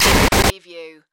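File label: Production Element Distortion; Sound Effects Bible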